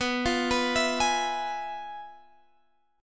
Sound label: F Minor Major 7th Flat 5th, B